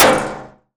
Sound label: Tin Tray, household rhythm samples hits